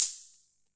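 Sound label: Olson Cym B, cymbal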